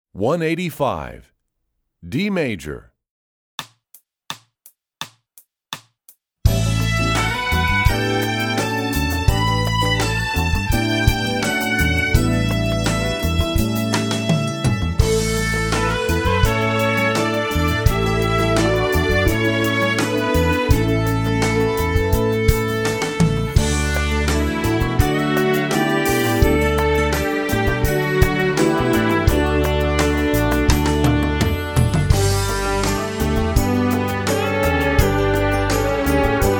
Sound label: D Major, strings; EE2000 Book2